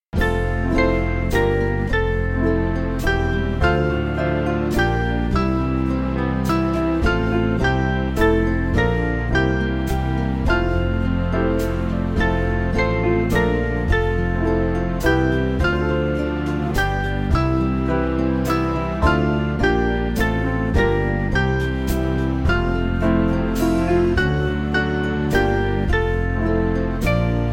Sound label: How Shall I Sing, Band; Solls Sein